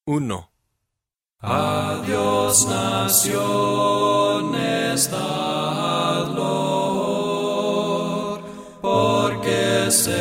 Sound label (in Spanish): A Dios naciones dad loor otra melodia palabras